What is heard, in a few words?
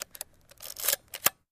Antique Camera Film, shutters; shutters & movement